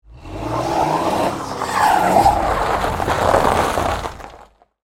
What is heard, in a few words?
Tires Spin Snow, Transportation, Automobile, Tires, Spin, Snow; Saturn, Vue; Car